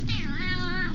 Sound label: yeowowow